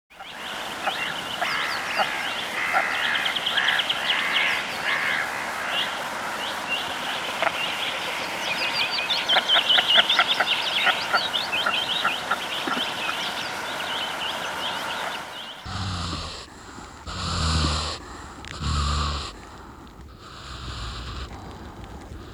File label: Goosander